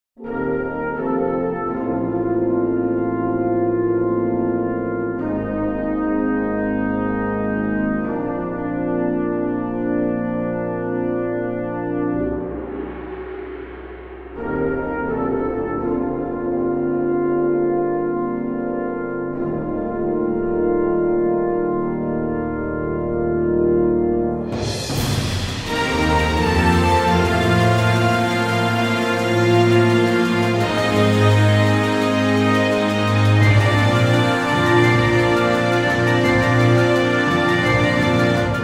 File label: Fanfare